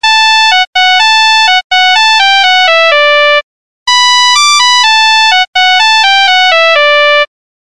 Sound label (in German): melodie; jolochka